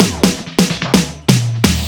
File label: Fills 128BPM, Clubsounds